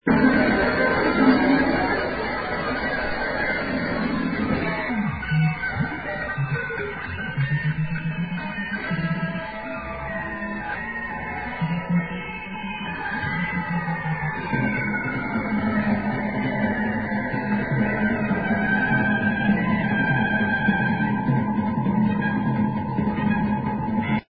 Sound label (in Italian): Musica